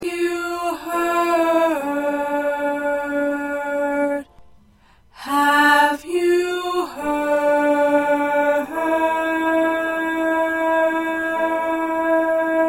1st Tenor

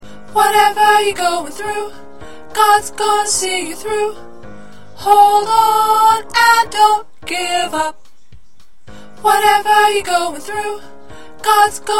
Alto, choir parts

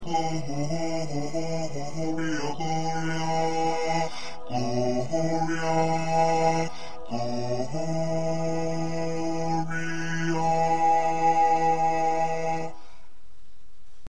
Bass

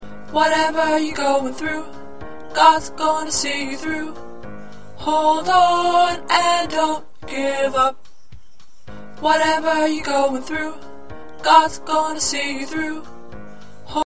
Tenor, choir parts

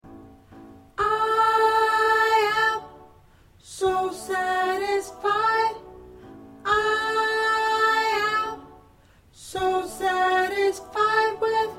Soprano

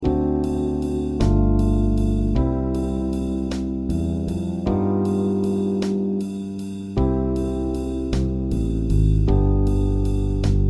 Accompaniment

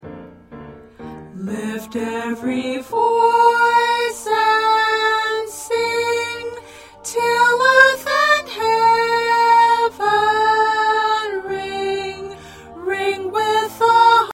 Soprano, choir

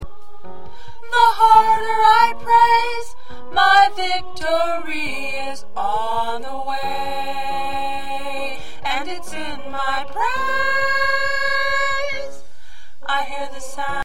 Alto, choir parts

